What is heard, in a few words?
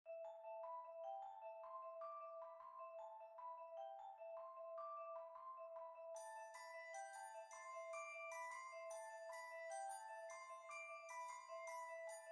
Tubullar Bells